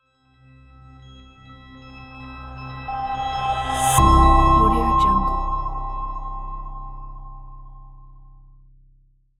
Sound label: bright logo